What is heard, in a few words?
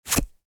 Wallet removing credit card; purse